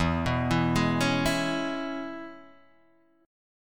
E 6th, down, Guitar, Open D

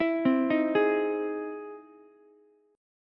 E Augmented Major 7th E down Ukulele; arpeggiated; E+M7; JGuitar

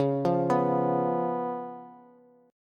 Db 7th Db down Bass; Db7 arpeggiated; JGuitar

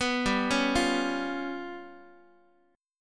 G 7th Flat 5th, G, Guitar; arpeggiated; x,x,2,5,5,4; 4A,4E,5A,5D,5Gsharp,6Csharp

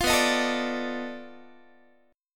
B 7th Sharp 9th Flat 5th, down, Guitar

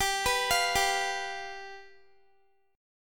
G 7th, Guitar; arpeggiated; x,22,21,22,19,x; 4E,4A,5D,5G,5C,6F

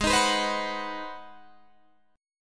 Ab 7th Sharp 9th Guitar Open D, chord

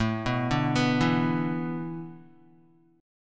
A Suspended 2nd Suspended 4th, Guitar; Asus2sus4; arpeggiated; 4F,4A,5C,5G,5C,6E; JGuitar

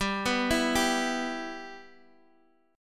E Minor G, Guitar

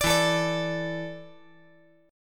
E 7th Suspended 4th E down 5G 4C 5E 5A

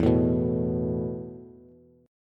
E Suspended 4th E, down, Bass; Esus4 strummed

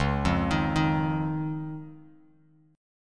C sharp Major Add 9th, Bass Standard; C#add9 arpeggiated; JGuitar

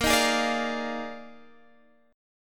Eb Major 7th Suspended 2nd Suspended 4th Bb

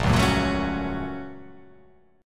A sharp Minor Major 7th Sharp 5th

